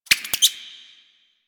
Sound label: Exterior Forest Critter; Warframe; Ambience; Duviri